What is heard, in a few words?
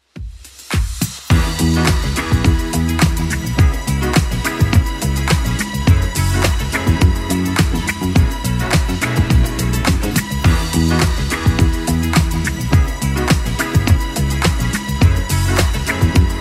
80s club 105 bpm clean